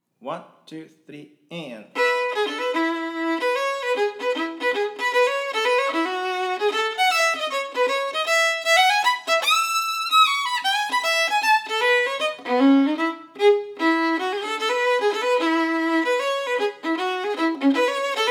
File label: hornpipe Fast; Seeking hornpipe; keyreels tunebook